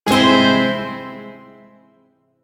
Shawzin Phrygian Chord I; DE; Warframe; Duviri Shawzin

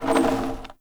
Wood Stool Drag Scrape

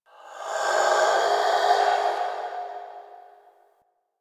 Texture Interior Large, Ambience; Sentient Lair; Warframe

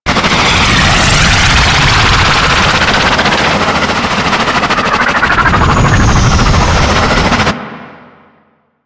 Hub Dist Loop, Ambience; Liset; Warframe

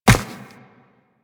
Run Ice, Footsteps; Warframe; Tenno

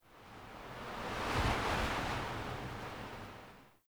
Wind Airy Gust; Ambience; Dungeons; Under City

